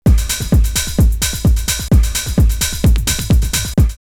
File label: Techhead 129, drumloops Rave; X-Static Goldmine; 90 s Sample CDs